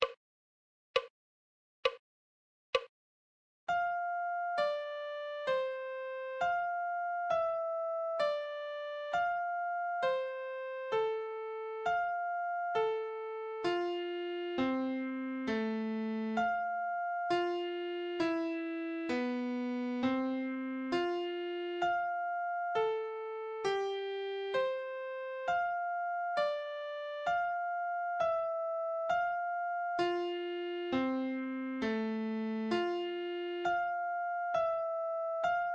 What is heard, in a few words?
musical reading in treble clef Adagio